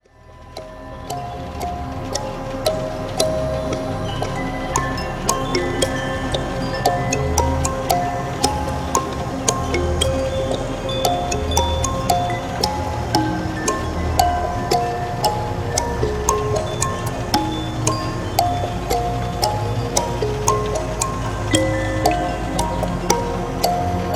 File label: Bells in the Air